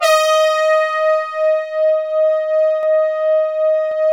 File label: HORN, MATRIX 12; Big Fish Audio - First Call Vintage Keyboards